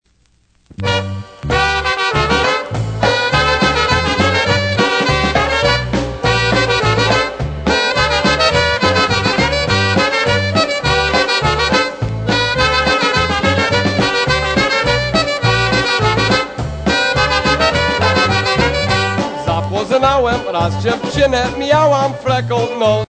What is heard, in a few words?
ROSIE POLKA